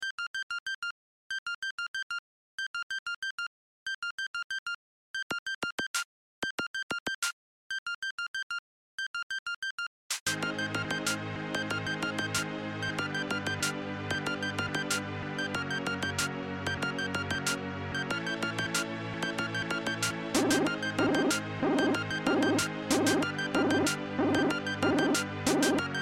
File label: bell dnb